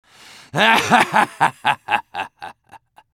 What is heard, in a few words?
laugh evil; medic; Garry's Mod; tf2 content